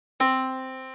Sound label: yamaha Disk